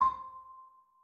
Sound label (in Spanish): tiempo atras final